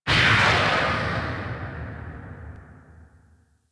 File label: Gun Battery Beam Void Burst Open, Ship; Ambience; Props; Warframe; Corpus Ship Remaster